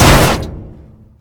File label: gunnery cannon shell; Weapons; EK modern; Barotrauma